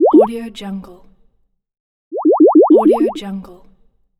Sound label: bubbles